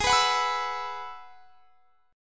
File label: Ab Minor 7th Sharp 5th Ab, down; Abm7#5; strummed